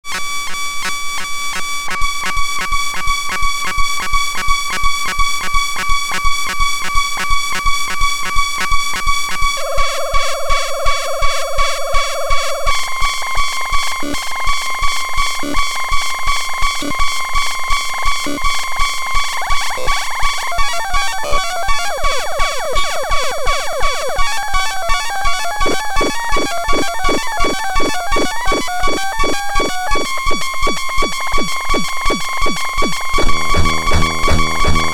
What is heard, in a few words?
hss2d